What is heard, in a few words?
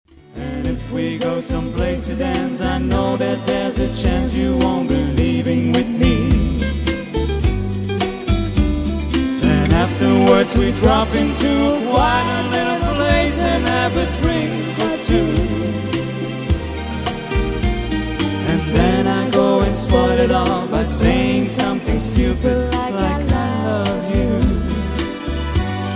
DUET LIVE